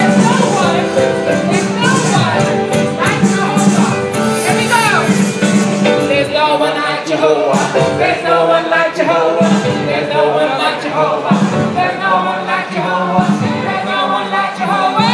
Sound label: Rye Lane singing